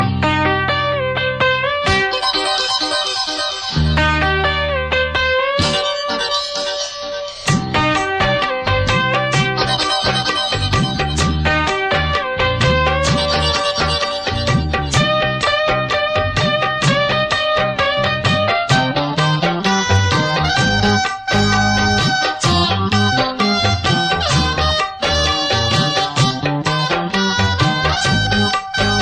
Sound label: melody